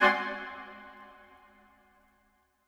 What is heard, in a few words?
Woods High Hit